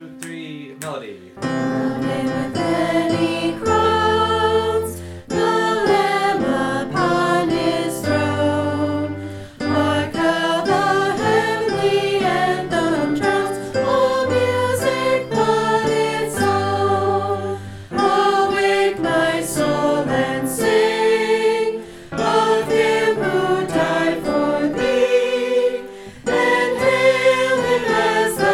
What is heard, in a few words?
SATB, choir